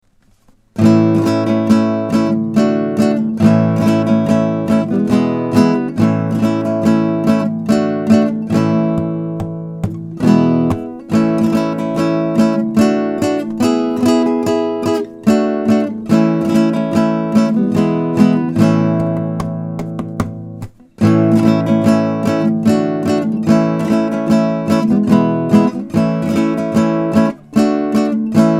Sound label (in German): Spanischer Tanz